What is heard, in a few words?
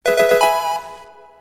Level Up